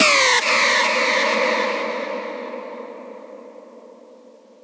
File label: synth screech oneshot E; Synth Hits; GhostHack